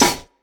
snare single hit b key, steel snare drum tuned to the B note; drum single hits one shots; snare drum samples